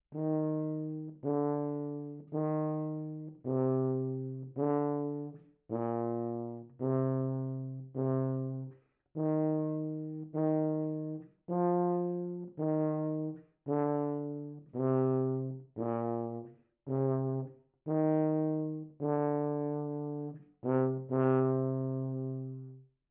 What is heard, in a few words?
tuba dies irae; 8khz